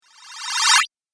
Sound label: Magic Fx Video Game